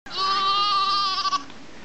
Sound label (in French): Le mouton bêle